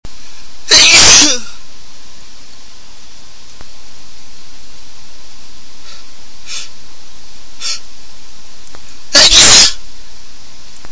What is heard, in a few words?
hard sneezes, male